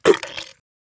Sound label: mud